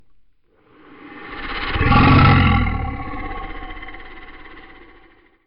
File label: Beast Roar